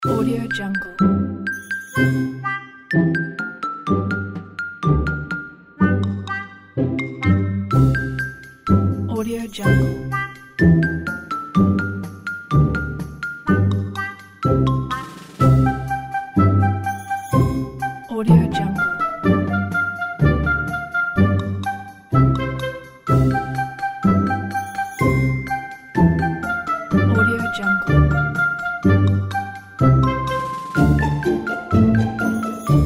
positive happy summer holidays

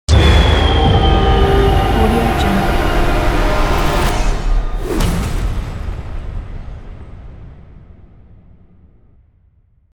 horror movie logo

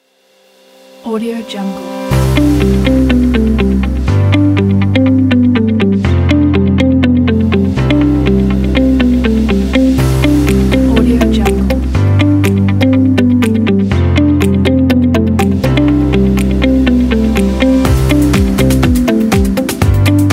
pop uplifting

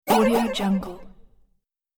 cartoon boing impact

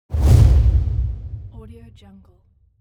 woosh

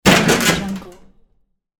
body fall and crunch on car

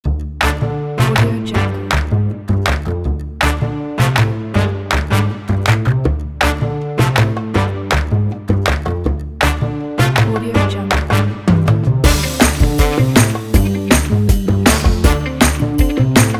quirky retro spy kit

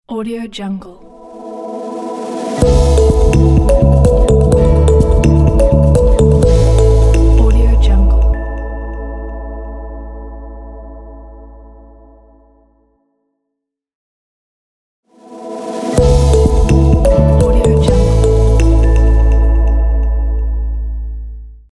news logo

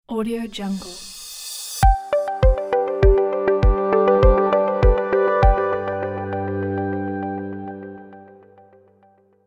beautiful ident logo

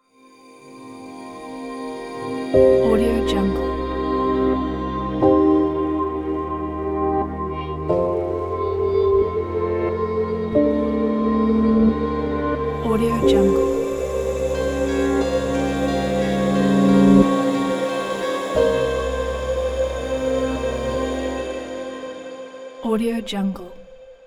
ambient emotional documentary ident

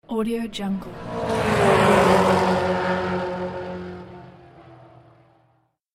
race car pass

